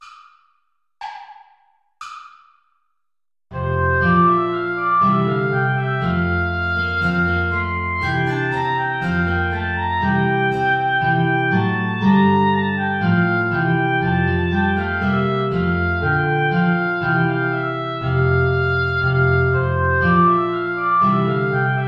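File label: bourrée play along without guitar; praetorius michael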